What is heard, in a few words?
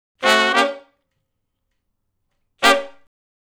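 R&B Riff (Eb) harmony; Sections; Crimson Blue and Fabulous Horncraft 4 RnB; Horncraft for R&B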